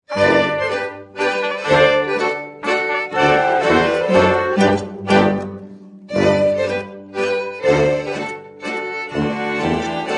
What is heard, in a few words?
Mazurka